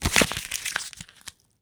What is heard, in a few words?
Index Card Crinkle; Office Supplies